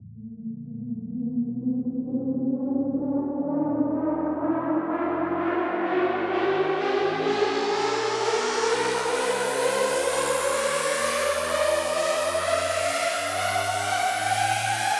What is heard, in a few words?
Riser - 128 BPM A; House; FX; Risers; Hands Up Riser